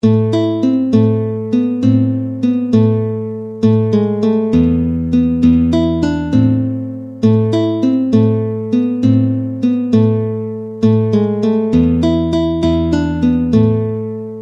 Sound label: guitar; Riviere, Marie-Line